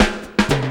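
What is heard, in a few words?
drum roll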